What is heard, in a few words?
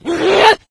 become enraged, infected, male